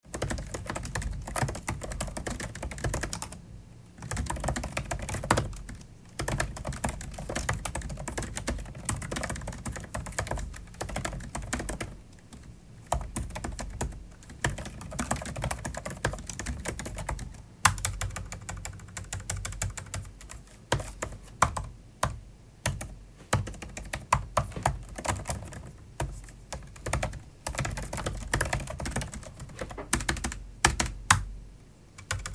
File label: computer typing